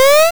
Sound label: jump